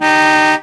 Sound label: auto large car horn